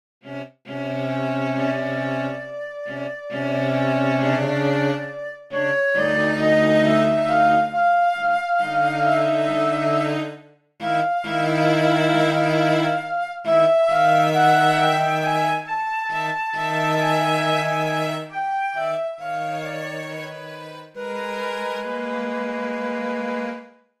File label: Adagio Sostenuto molto espressivo, Flute, Viola, and Violoncello; 5 short pieces